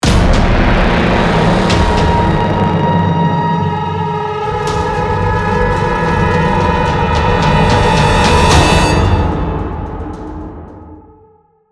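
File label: Music Horror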